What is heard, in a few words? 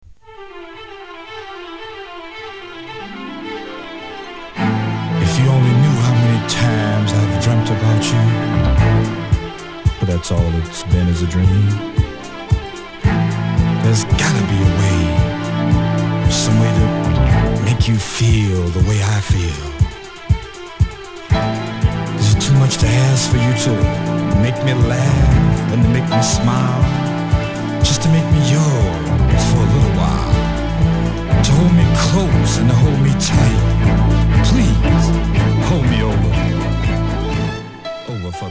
(Instrumental)